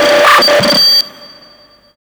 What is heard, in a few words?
CYBERGUN R, Hard & Loud Techno; USB Soundscan vol.01; AKAI